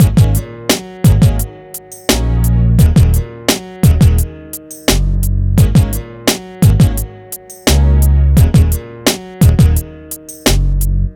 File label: MIX, Hip Hop and R&B Drumloops